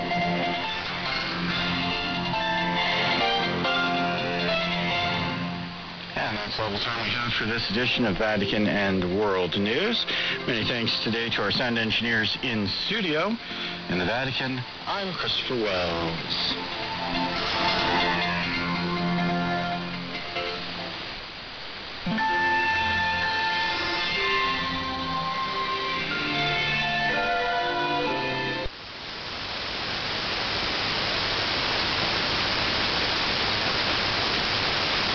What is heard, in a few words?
Vatican Radio sign off